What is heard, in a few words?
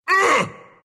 pain ru; Husk; dota2